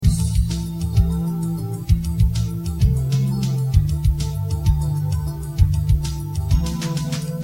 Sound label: dream loop